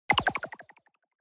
Onoma Surprise Down

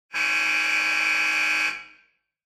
Electromagnetic Buzzer Room

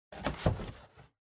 Cardboard Box Open; ダンボール03-04(開ける); 効果音＞梱包材

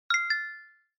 Smartphone UI Sound Speaker

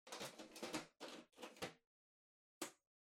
Printer Far Rear Tray Close

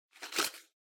Drawing Box, Ticket Noise

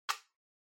Portable Radio Earphones Unplug

